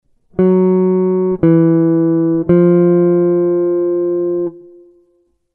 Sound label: guitar